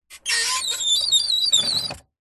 household cupboard door roller squeaky slow movements; zapsplat